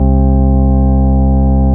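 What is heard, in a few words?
CX3 overdrive C3, ORGAN; Sonic Reality; Vintage Timetraveler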